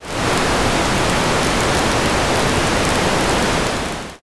fire

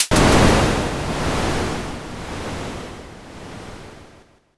thunder

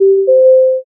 m notify

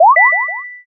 unlock

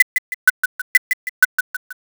ticktock

